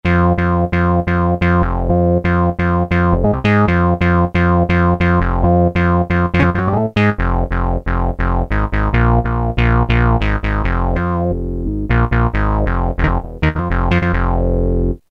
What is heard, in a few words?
Synbass Five; Roland M-DC1